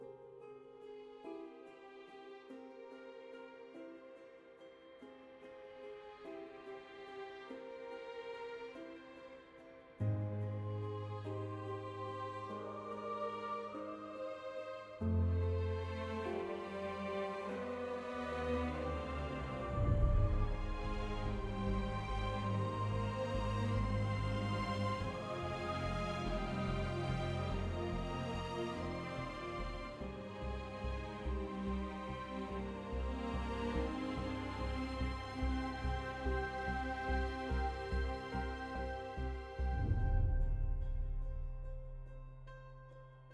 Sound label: music in queue loop; crepe; bridgeofprogress